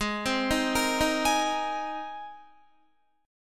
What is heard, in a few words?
G sharp Major Add 9th G sharp, Guitar; G#add9 Chord